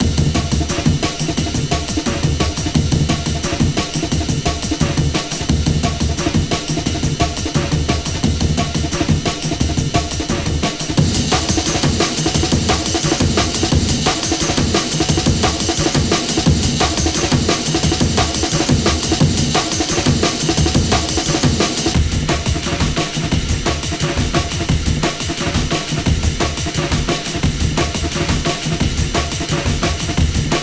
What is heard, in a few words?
the Amen break song